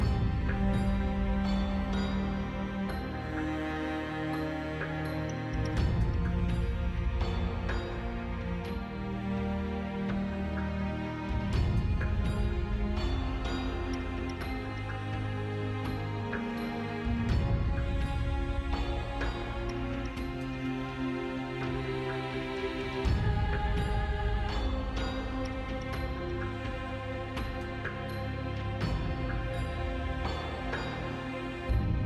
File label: victory loop; crystalscar; lol